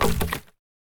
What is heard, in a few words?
firewood put